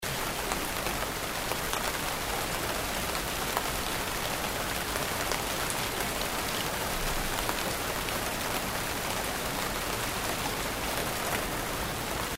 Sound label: rain